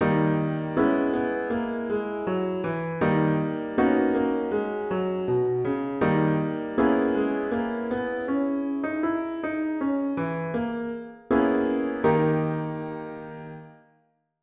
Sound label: phrygian